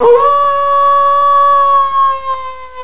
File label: Night Wolf; Night noises: wolf howling (3 pitches)